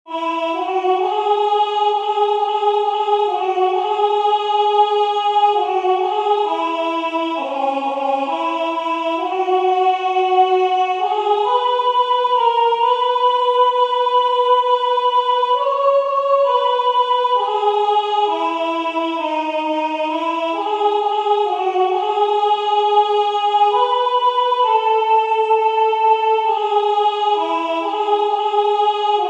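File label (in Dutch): Soprano, koor; Notre Pere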